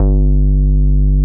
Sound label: Moog hi G1, analog bass; Music Store - Claudius Diemeter Schwerlos